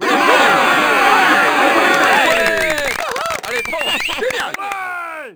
goal, Crowd, French

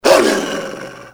bark, dog; enemy; Soldier Of Fortune II Double Helix; XBOX

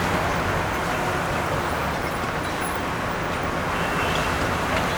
City Ambient Loop; Totaled; XBOX

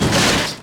crush, Impact

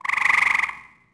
frog; Tony Hawk's Project 8; XBOX; houses

